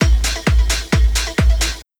Wheel; Super Monkey Ball 2; Monkey Baseball

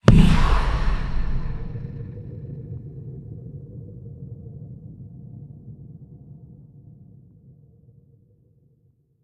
Smoke Granade explode; we are the dwarves gamerip 2016

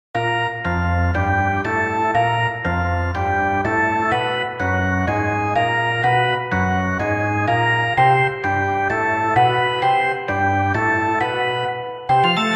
US Sports Organ Charge; ufs league switch gamerip 2022

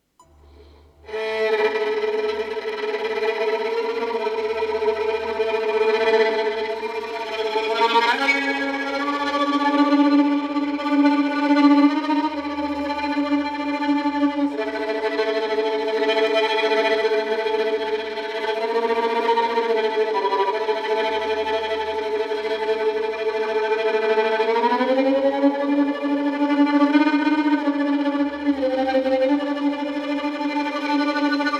violin ambience; WildGrueMiniboss; world to the west

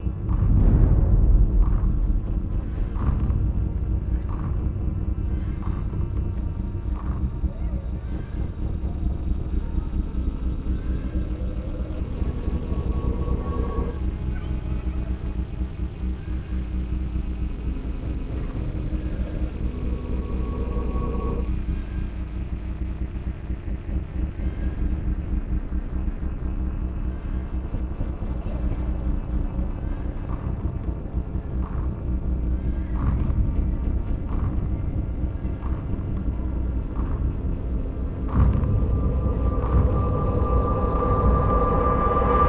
Haunted Mansion Ambience; dust an elysian tail

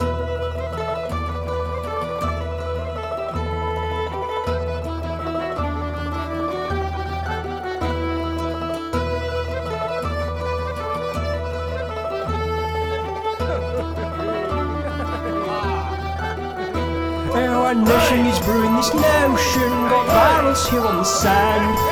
Chorus

